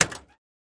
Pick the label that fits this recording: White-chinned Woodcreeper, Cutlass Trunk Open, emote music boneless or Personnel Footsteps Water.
Cutlass Trunk Open